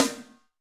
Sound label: Snr Hyper Pic, Alesis D4